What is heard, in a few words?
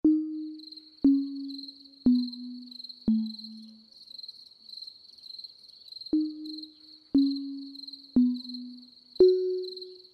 Sleep Music